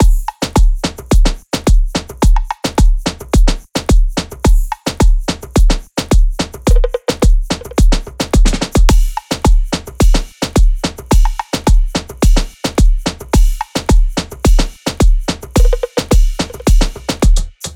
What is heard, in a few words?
loop full 108; Seismic Loops